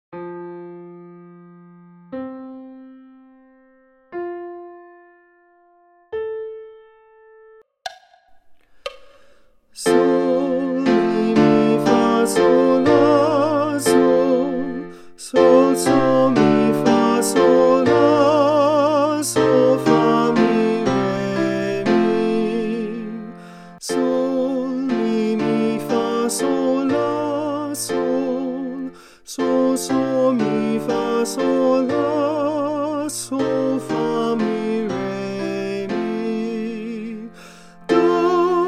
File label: tenor solfege; lasso; o occhi manza mia